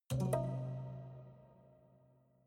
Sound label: haunted strings chord diminished damped